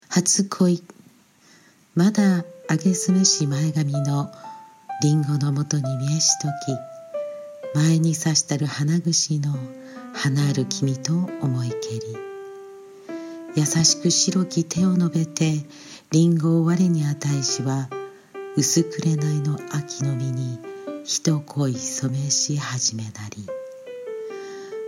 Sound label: Vocal Piano